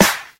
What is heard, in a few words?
airy snare sample g key